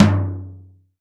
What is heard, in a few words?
HRD, 50S JAZZ KIT; Northstar - New Gold VOL-1